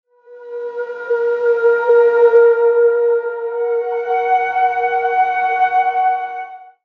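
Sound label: Eerie synth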